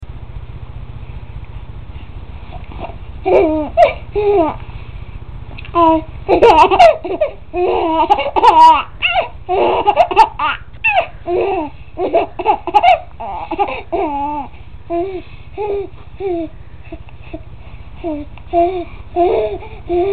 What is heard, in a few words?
Giggle